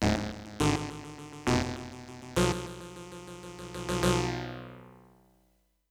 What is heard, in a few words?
ditty